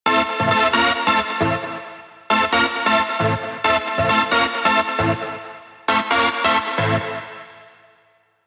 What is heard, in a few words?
rave stabs; Doppleganger; revealed spire rave stabs